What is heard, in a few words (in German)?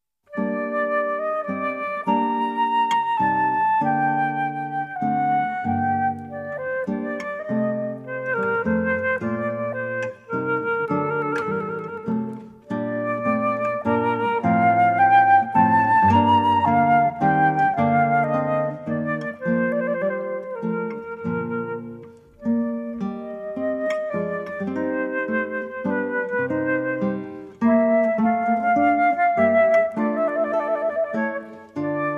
vivaldi sonate g moll largo